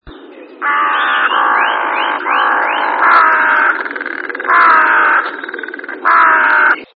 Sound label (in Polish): gawron, krukowate - corvidae; groźnie brzmiące gawrony